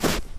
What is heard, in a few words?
Snow Crunch